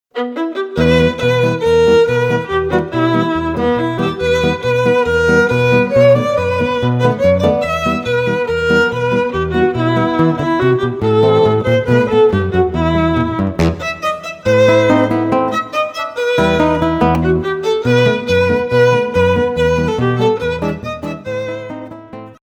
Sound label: Duo of Guitar & Violi; Mazel Tov; Lyrica Demo